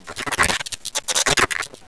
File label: growl, insect; Heretic II Demo; monsters